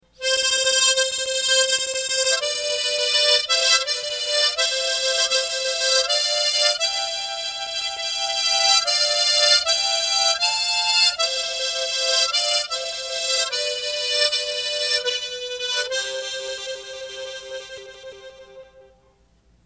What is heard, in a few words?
furusato mandolin